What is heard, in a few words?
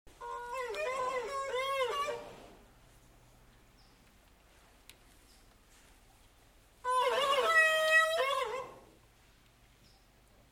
Wind Instrument